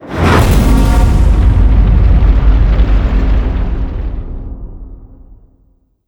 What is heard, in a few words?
level up; lucius